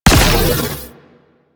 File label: Ice Weapon Beam Fire; Warframe; Corpus; Corpus Railjack; Spec Ops Ice Moa